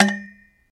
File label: Bullet Shell Copper, shell casings fall; Bullet Shell On Copper Tubing, Double